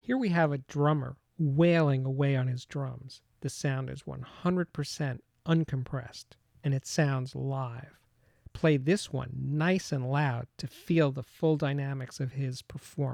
Drum Kit Dynamic Range Uncompressed Announcement; The Ultimate Headphone Demonstration Disc ( 24Bit192kHz ); Chesky Record

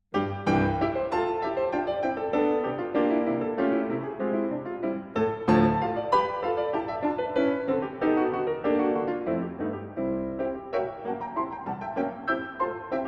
Pieces for Piano, Rigaudon; 10 Pieces for Piano, Op. 12 - III.; Sergey Prokofiev

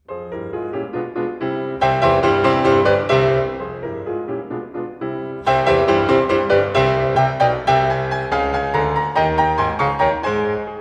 Sonata in A-flat Major, Allegro molto; Beethoven - The Late Piano Sonatas

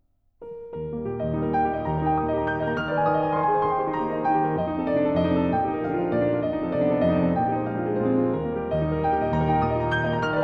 Préludes, in E-Flat Major; Chopin Preludes

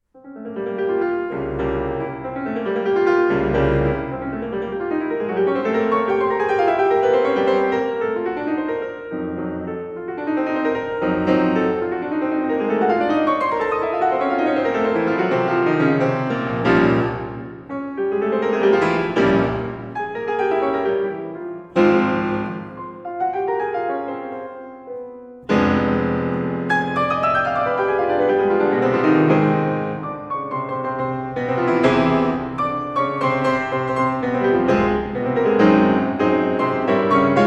Preludes in F minor Allegro molto; Frederic Chopin; Twenty-four Preludes, Op 28

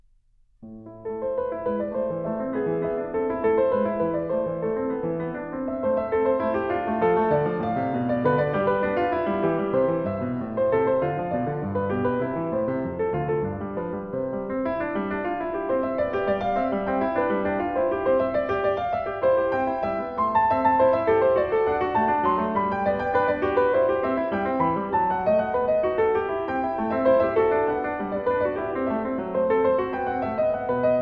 Invention in A Minor; Invention No. 13; BWV 784; Bach Inventions & Sinfonias, BWV 772-801

